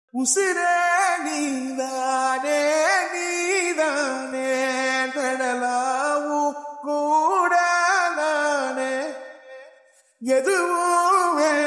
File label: tamil ringtone; raayan